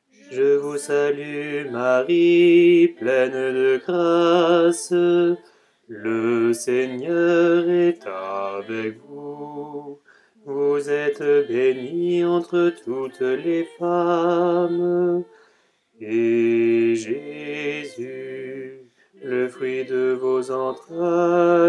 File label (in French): je vous salue marie basse